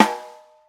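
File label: wet steel snare drum sound a key, tuned to the A note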